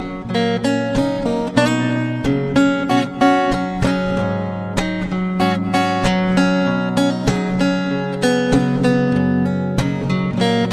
instrumental, ringtone

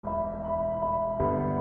sms; Mass Effect

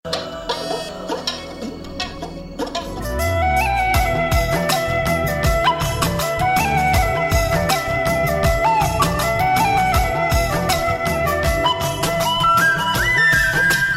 beautiful tamil flute

